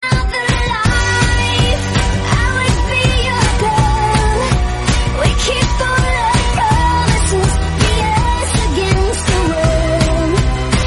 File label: sped up english song